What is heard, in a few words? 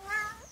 meow